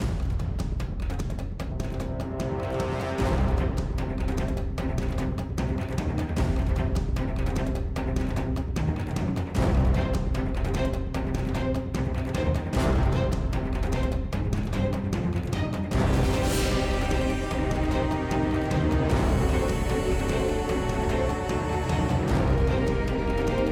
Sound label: Action Suspense; rwby grimm eclipse